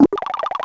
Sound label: Giant Dry Bones